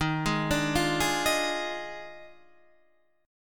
Eb Major 7th Suspended 2nd Suspended 4th Eb Guitar Open D